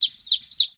Bat Squeal; Playable Tutorial Level

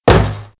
Cabinet Close Wood; Playable Tutorial Level

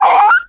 Dog Death; Playable Tutorial Level

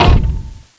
Metal Door Close; Playable Tutorial Level; TR1

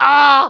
Monk Shout; Playable Tutorial Level; TR2

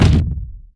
Stalegtite; Playable Tutorial Level; TR3

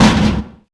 Metal Door Open; Playable Tutorial Level

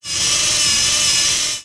1st Loop For Big Drill; Playable Tutorial Level; TR3

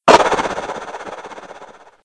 Underwater Chaff; Playable Tutorial Level

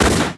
Siren Swave; Demigod; Playable Tutorial Level

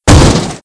Claw Crash; Playable Tutorial Level

